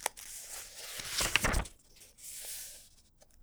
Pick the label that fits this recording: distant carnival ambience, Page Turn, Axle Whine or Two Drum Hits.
Page Turn